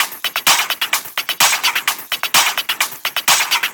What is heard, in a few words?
Loops 128BPM, Drumloops